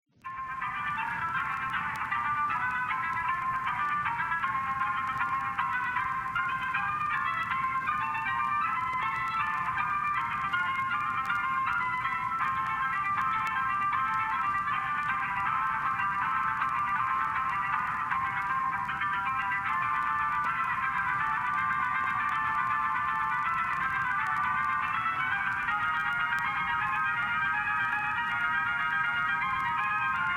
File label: Radio mono Strings Quartet; Alexandr Borodin